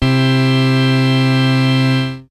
HOUSE CHORD; TECHNO-BOX; SYNTH+BASS

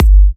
G, Kicks; markus hakala

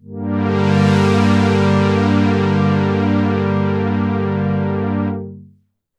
Synths; JUNO106

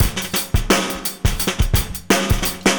Drum Loops Vintage; Zero-G Smoke

